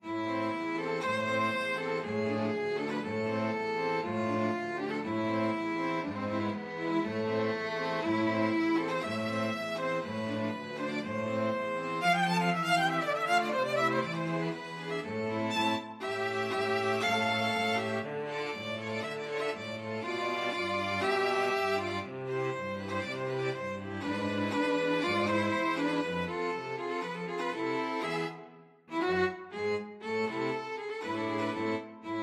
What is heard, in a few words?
hungarian dance, string quartet; brahms